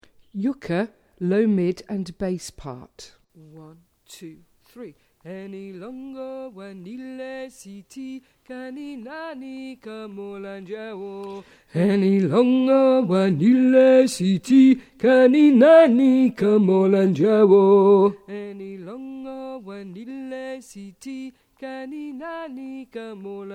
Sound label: bass low mid; yukka